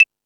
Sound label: Beat Box; E-MU Pro-Cussion